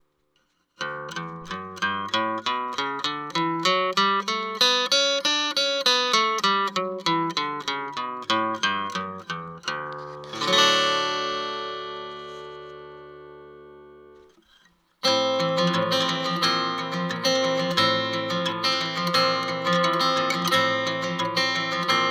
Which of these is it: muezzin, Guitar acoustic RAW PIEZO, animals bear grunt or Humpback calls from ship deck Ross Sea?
Guitar acoustic RAW PIEZO